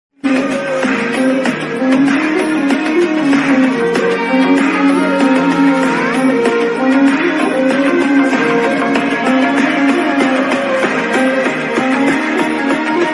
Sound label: attitude ringtone bgm ringtone; sigma male